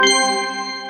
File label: success